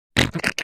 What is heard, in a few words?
snort